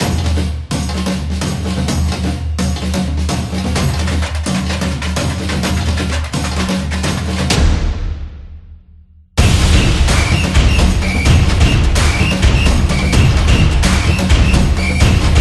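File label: The Soccer Intro, Sport percussion background music created for action, competition; sports beat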